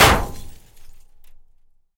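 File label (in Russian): короткий негромкий тупой грохот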